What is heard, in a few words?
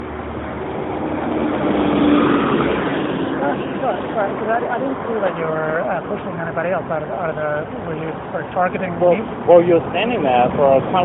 St Pauls Church Auckland outside; Blake Ramage